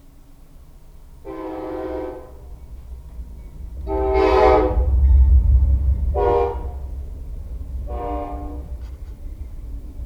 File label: train whistle